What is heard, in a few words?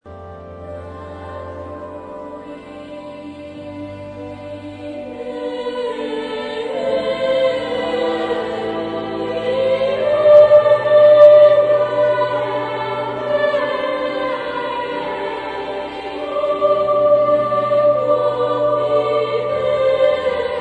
Veni Sancte Spiritus (female, Organ); Da Tuis Fidelibus; chorus